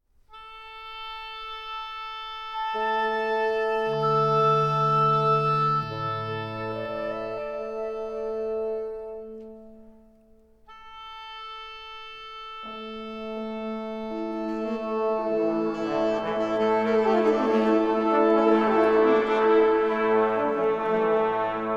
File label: Orchestra Tune Up